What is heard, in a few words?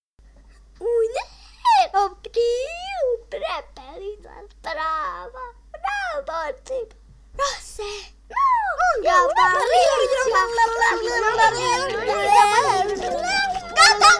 Karaoke